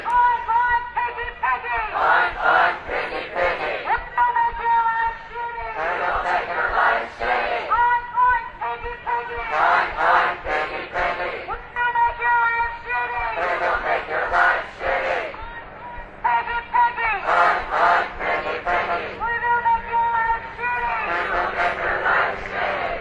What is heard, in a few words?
oink piggy